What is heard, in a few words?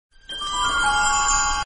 Twinkle Message Tone